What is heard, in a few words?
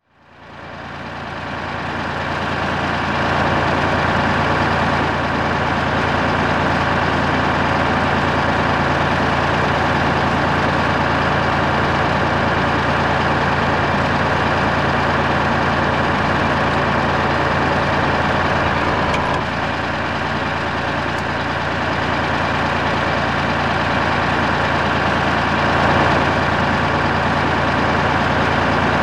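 zvuki tanka, tank sounds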